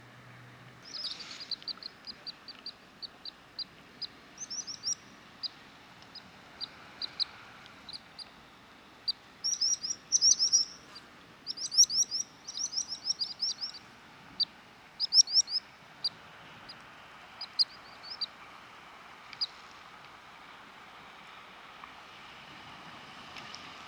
apró partfutó hangja

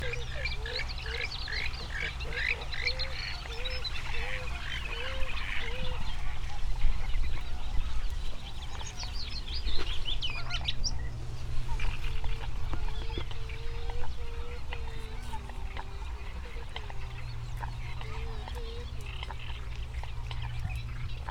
kak fu hangja

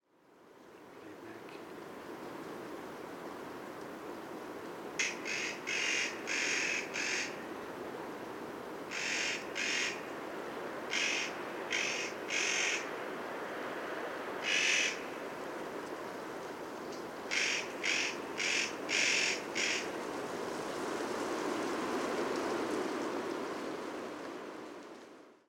fenyőszajkó hangja

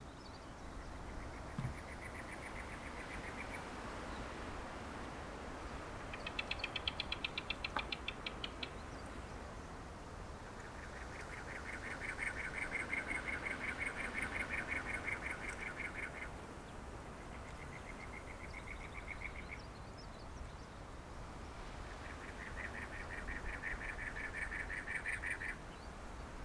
kaktuszökörszem hangja

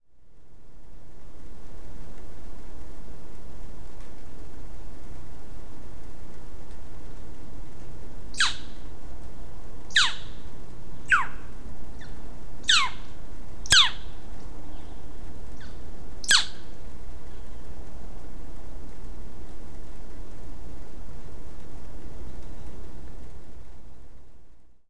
gatyaskuvik hangja

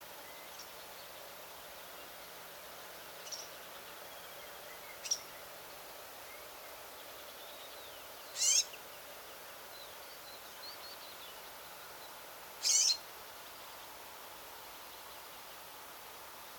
csicsörke hangja

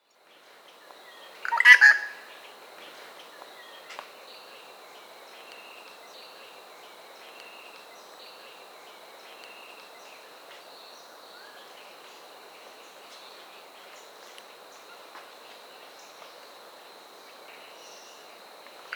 kosza szarka hangja

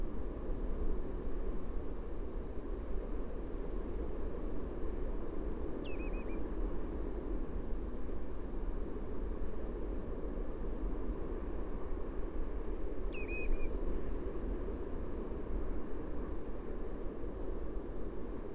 piroslábú cankó hangja

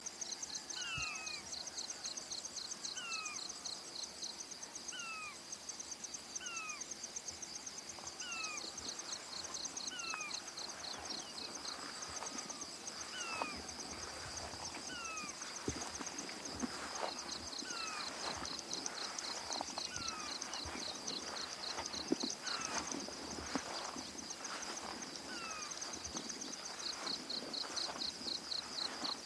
kínai törpefürj hangja

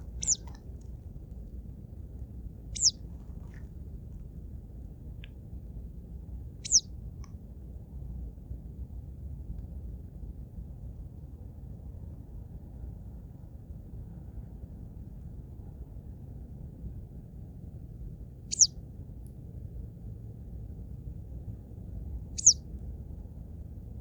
citrombillegeto hangja

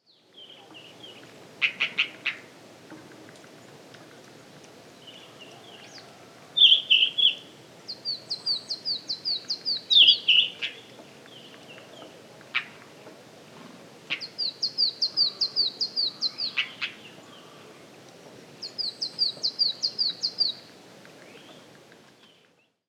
himalajai kekfarku hangja

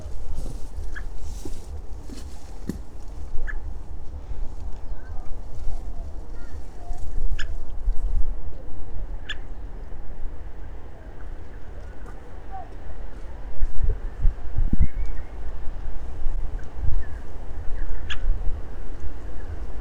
feherhatu fakopacs hangja

